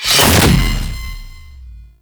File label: Sentient Symbilyst Shield Lock; Warframe; Abilities